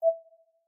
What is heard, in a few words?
vr button ok long press; LG V20